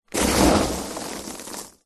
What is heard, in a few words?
office foam packing peanuts in box hand in movement